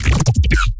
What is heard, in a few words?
bass glitch fill